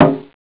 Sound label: surdo; jazz jackrabbit 2